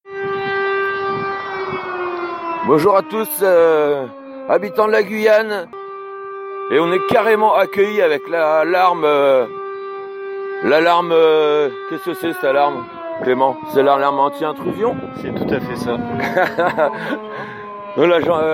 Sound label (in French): sirene pompier intro manif